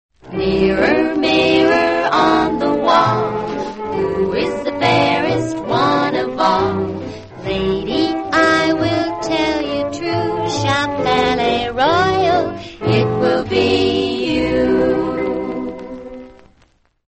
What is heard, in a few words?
radio jingles; palais royale; allied radio artists